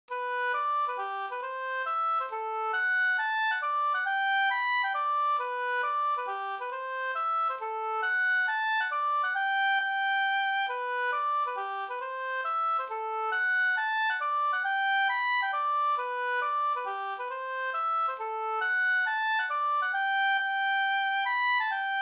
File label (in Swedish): polska; e Olof Andersson Haggnas Holo Sd